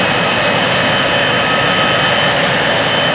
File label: jet; Mafie 1